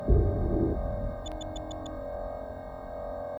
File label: Ambient Weapons; Among Us